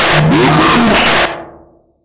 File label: zombie hgrunt alert